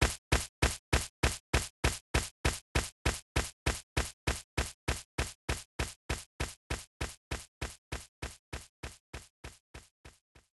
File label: Advmap Walk; Puyo Puyo Puzzle Pop; iOS, macOS, mobile